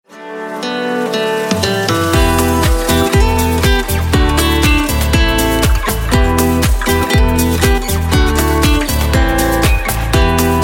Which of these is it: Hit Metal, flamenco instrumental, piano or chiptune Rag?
flamenco instrumental